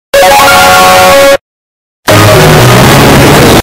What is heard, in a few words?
opening sound but earrape; clash of clans